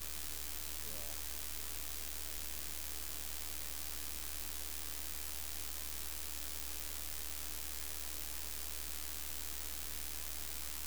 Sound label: String Of Lights; Electronics Of My Grandma's House